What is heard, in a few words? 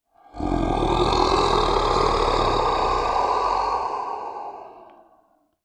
Horror Scary Demon Growling